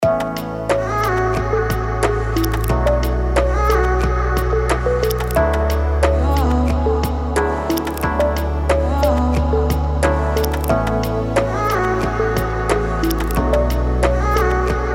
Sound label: Hip Hop; Homework Hip Hop Vol 2